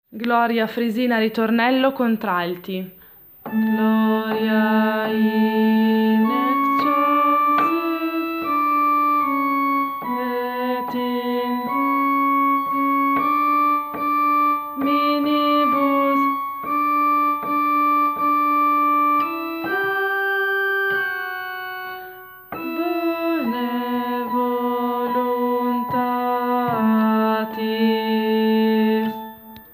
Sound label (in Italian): ritornello contralti